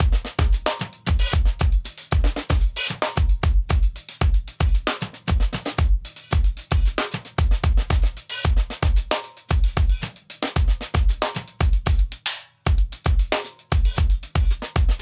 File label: Double Hi-hat 114 bpm, drums loops; BASEMENT DRUMS VOL.1